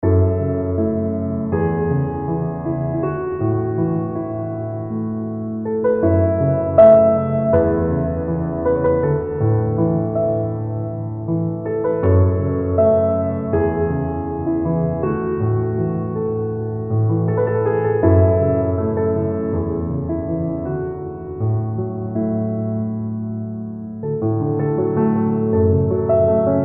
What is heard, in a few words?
1928 steinway, Klaviermusik